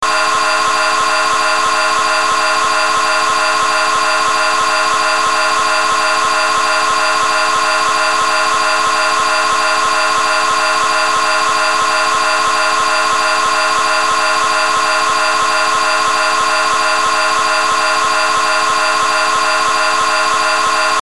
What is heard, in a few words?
Cym and HH Waveform